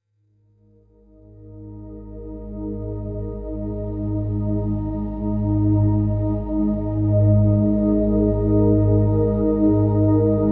ethereal flow

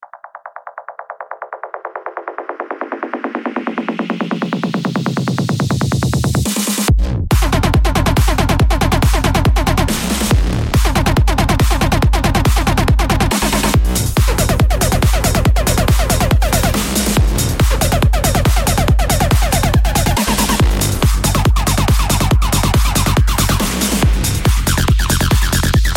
high energy electropulse

